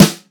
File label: warm snare drum g sharp key, snare single hit tuned to the G# note; snare drum one shots